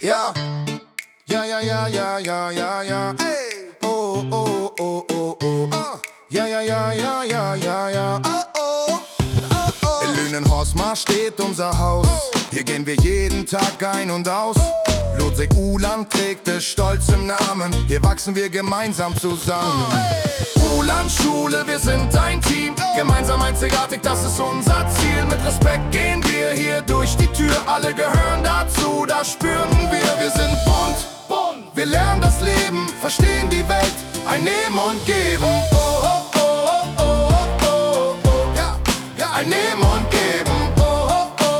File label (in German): LUR Song Rap Beats